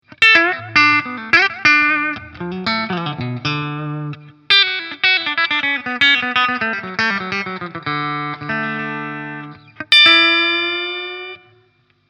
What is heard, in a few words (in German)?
PRS SE Custom 24 Roasted Maple Steg Split clean